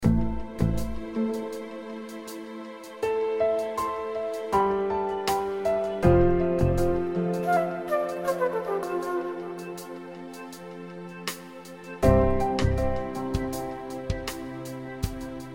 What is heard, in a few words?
music; Rescue Team 2